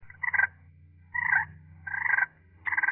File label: purr